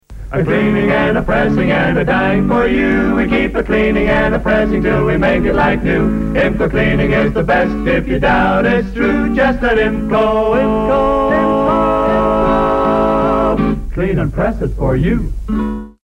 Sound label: radio jingle; irelands first ever radio jingle; imco cleaners